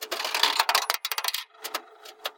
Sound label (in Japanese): zihanki eject